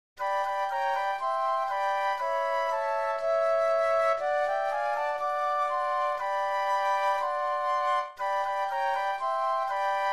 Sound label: Flute Trio